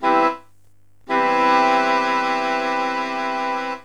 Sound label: MAJ 7TH, WINDSECT